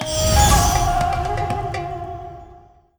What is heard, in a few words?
symbol piramid; Demon Jack 27; Wazdan